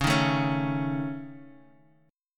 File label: Db Suspended 2nd Sharp 5th, Guitar, Drop D